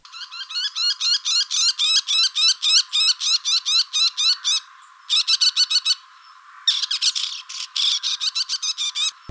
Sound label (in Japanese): mozu sound